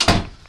Door slam

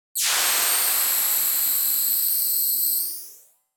Energy Blast

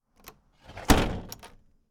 Door Shutting

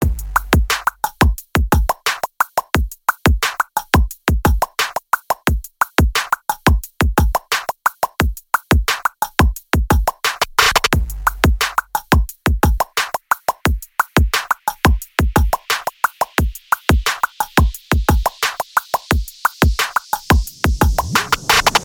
Chill Trap Beat

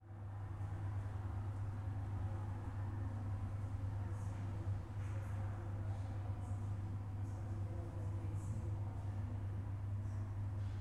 Vitrine; Stedelijk

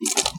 Splat

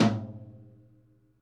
Tom Tight High Vol

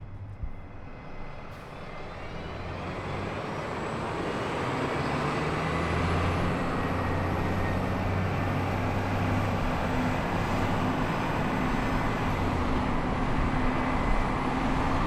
Bus

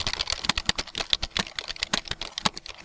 Typing Loop

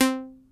Pling

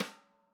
Snare brush light tap

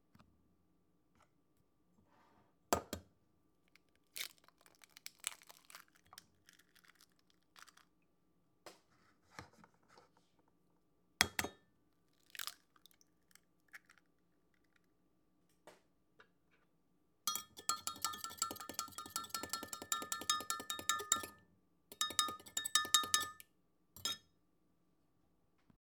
Beating Eggs

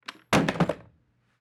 Things falling on floor

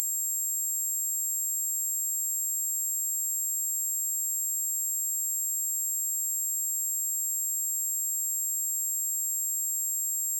Ear Ring

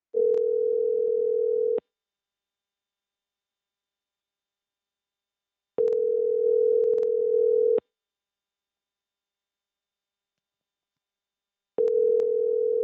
Cell Phone Voicemail

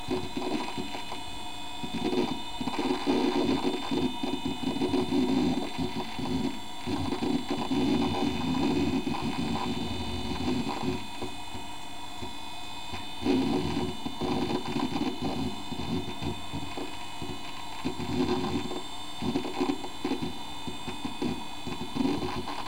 Computer Noise

